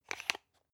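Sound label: toothpaste close hard, Bathroom; haus-garten; badezimmer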